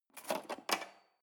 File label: cutlery cake fork drawer scrape